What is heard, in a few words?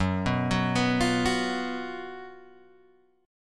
F sharp Major 7th Suspended 4th, Guitar, Open G